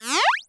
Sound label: open; pyrogue; Oryx